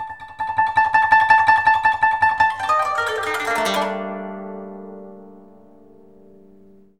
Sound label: KOTO ARP